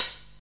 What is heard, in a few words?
studio hihat sound f key, Royality free hi hat tuned to the F note. Loudest frequency: 9394Hz; hat samples